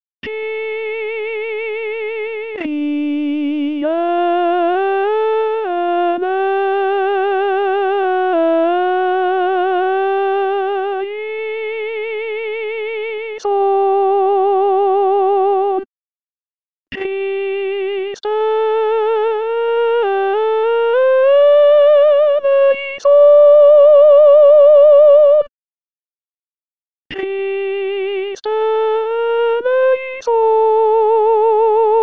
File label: Kyrie Tenor; Tenors seuls; La Messe O magnum Mysteriumde Tomas Luis de Victoria